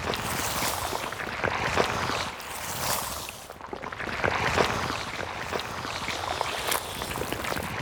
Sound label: tentacle en wind